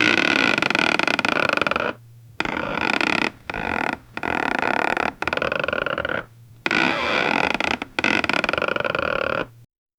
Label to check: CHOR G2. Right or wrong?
Wrong